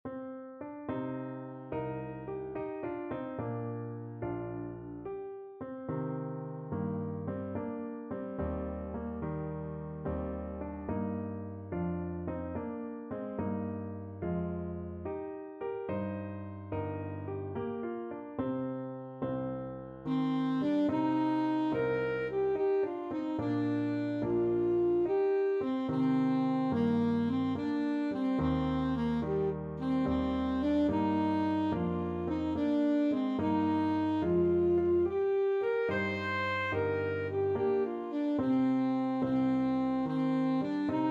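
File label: alto saxophone; fear a bhata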